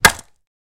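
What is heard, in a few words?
Staff Wood Hit Slight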